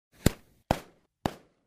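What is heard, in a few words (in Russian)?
malenkie petardy, small firecrackers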